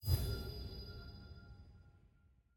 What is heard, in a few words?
button hover; champ select; lockin